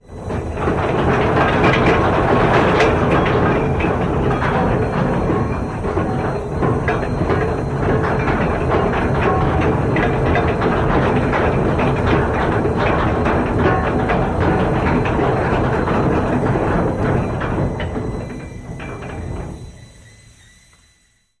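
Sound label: steam engine anchor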